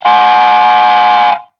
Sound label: flying bird whistle